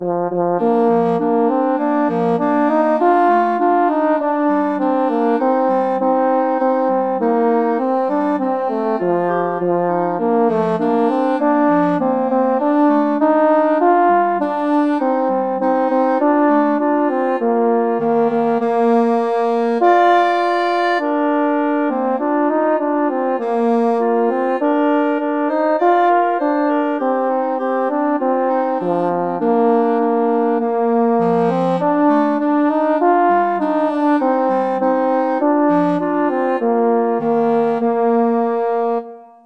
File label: clarinet horn, clarinet-french horn duet